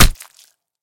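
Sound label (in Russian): вонзившийся в мякоть экзотического фрукта нож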